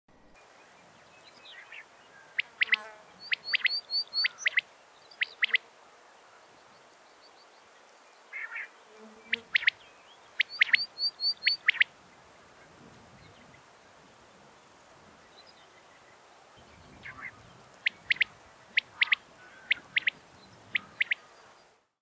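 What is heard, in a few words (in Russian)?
перепел пение перепела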